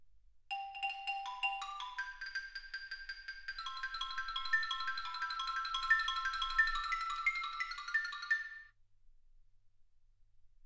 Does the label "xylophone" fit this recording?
Yes